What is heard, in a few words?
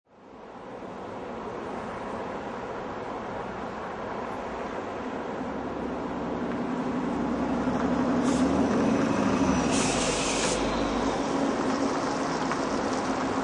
City Traffic Street Level, Cars and buses drive by; City traffic: Street level. Cars and buses drive by, some close and others more distant.